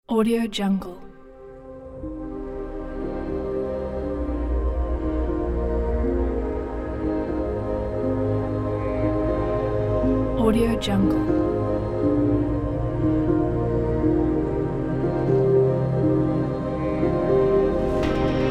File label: background ambient